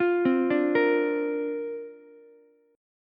C 7th Suspended 2nd Suspended 4th C down Ukulele; C7sus2sus4 arpeggiated

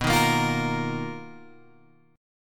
B Major Sharp 11th, Guitar, Open G

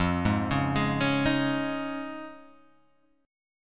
F Minor 7th Sharp 5th, Guitar, Half Step Down

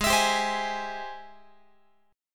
Ab Augmented Major 7th, Guitar; Ab+M7 strummed; x,16,19,17,16,19; 4A,4E,5A,5D,5G#,6C#

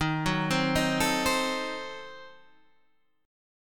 Eb Diminished 7th Eb down Guitar Standard; Ebdim7 arpeggiated; JGuitar

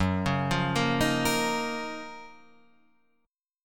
F sharp Major 13th Guitar Open F; arpeggiated; JGuitar

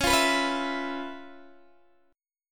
Db 7th Sharp 9th, Guitar, DADGAD; strummed; JGuitar

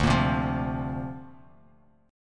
D-Minor 7th Sharp 5th, D; Dm7#5; strummed

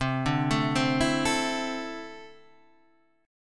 D 7th Suspended 2nd, C

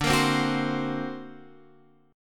D Augmented 7th, Guitar, Drop D

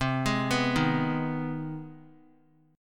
C Minor Major 7th Sharp 5th chord; CmM7#5 arpeggiated; JGuitar

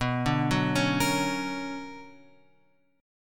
B Minor Major 9th; BmM9 Chord {7 5 4 6 X 6} arpeggiated

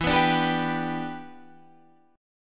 G 6th E

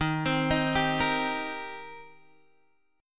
Eb 6th Eb down Guitar Open G

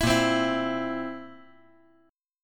A sharp Suspended 2nd Flat 5th A sharp down; strummed; A#sus2b5 Chord; JGuitar

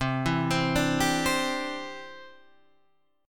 C Major Add 9th, Guitar, Standard; arpeggiated; JGuitar

